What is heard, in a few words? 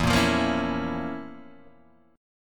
F Major 7th Suspended 4th Sharp 5th